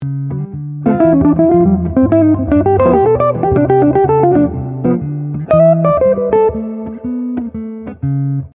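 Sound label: Lick, Jazz Fusion; Guitar Club Dicembre 2024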